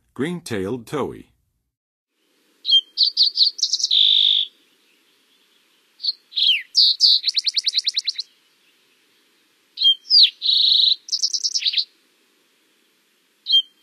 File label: Green-tailed Towhee, Songs of Birds of North America; Western USA; Western USA Songbirds